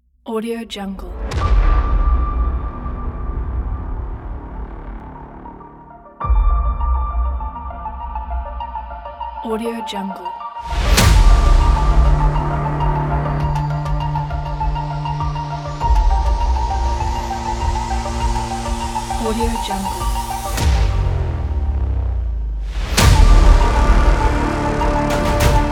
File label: scifi trailer; colortunes